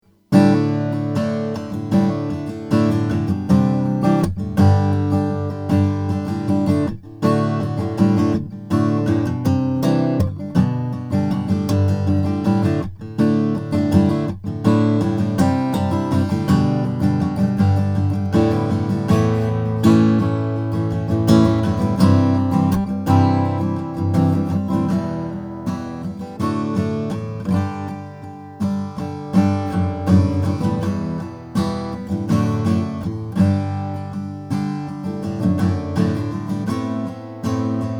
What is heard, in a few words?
froggy bottom j deluxe brazilian; 1980